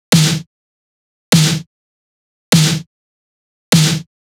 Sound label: synthwave snare 100bpm; synthwave anthems collection